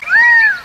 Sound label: Gambels Quail